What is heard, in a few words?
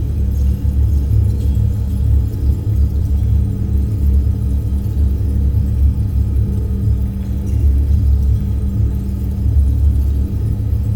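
ambience doom drones digital spaces; SoundMorph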